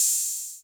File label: OH 808 Tape Decay, Open HH; Clean